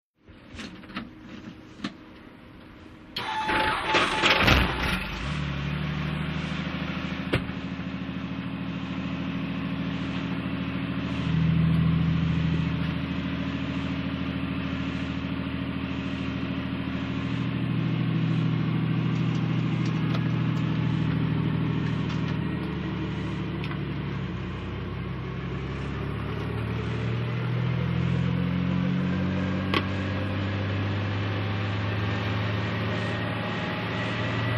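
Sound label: Heli Interior Start Close Door, helicopter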